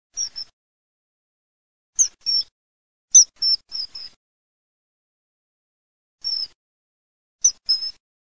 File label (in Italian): martin pescatore